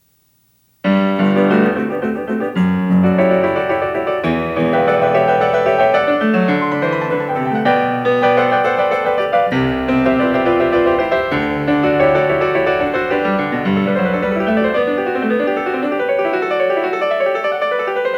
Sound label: Variation 29 a 1 ovvero a 2 Clav.; Bach; The Goldberg Variations